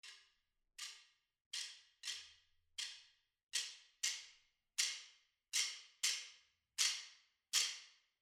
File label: Click Ensemble; Cerberus